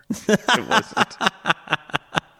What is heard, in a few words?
awesome laugh